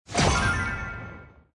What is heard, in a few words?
effect begin; Helloween